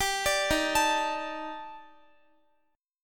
D sharp Major Sharp 11th, Guitar; D#M#11; arpeggiated

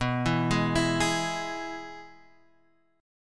B 6th Suspended 4th B, guitar chord; B6sus4; arpeggiated

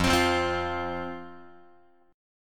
F 5th F, down, Guitar; F5 strummed

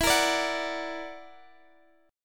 F sharp Augmented 7th E, chord

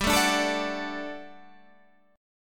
G Major 7th Suspended 2nd G, Guitar Standard; strummed

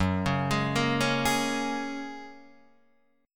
Gb Major Add 9th, down, Guitar, Open D

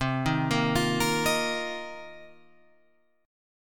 C Minor 11th, C, down, Guitar, Open F; Cm11 arpeggiated

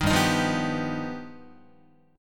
G Augmented 7th, Guitar; G+7 strummed; JGuitar

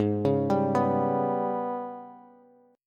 Ab Minor Major 7th Double Flat 5th, down, Bass; AbmM7bb5; arpeggiated

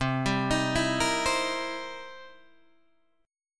C 7th Sharp 9th, Guitar, Open D

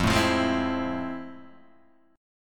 F Major 7th Sharp 9th, F, guitar; Fmaj7#9 strummed; JGuitar

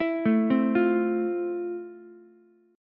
F Major 7th F, Ukulele; arpeggiated; JGuitar

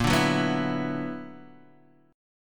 B-Minor Major 11th

